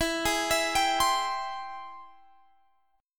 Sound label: Ab Augmented Major 7th E, guitar